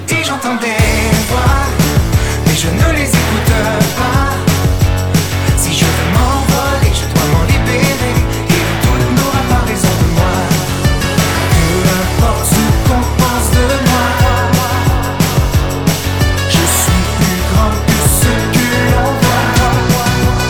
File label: hook; J'entends des voix